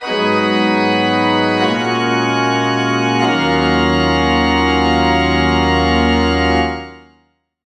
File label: Cathedral Organ